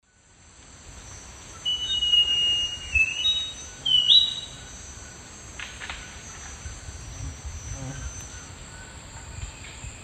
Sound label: Schiffornis turdina, song; S. America; Eastern Lowlands